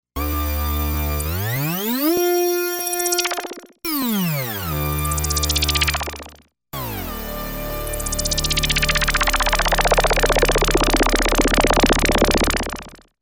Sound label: Delay Mod